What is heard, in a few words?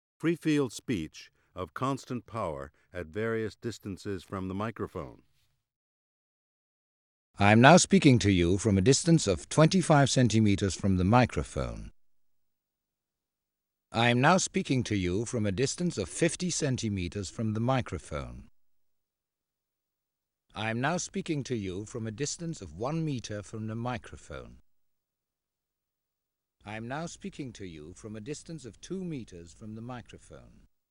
The decibel scale